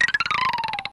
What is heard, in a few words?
Wooden Scale Down